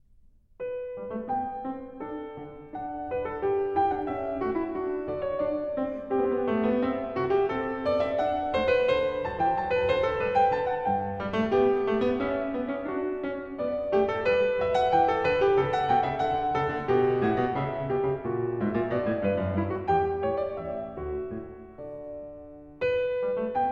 Bach Goldberg Variations BWV988 Variation 18 - Canone Alla Sesta (Con Moto)